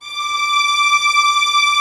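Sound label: Vio legato D5; Digital Sound Factory Virtuoso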